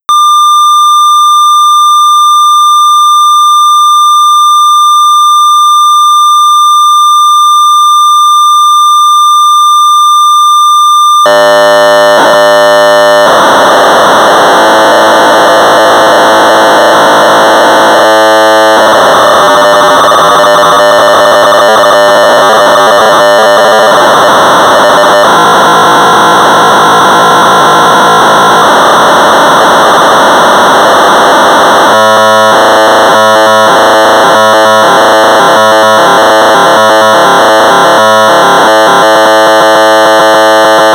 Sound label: Sorcerer 1200baud; Head-on-Collision; L. Andres; Quality Software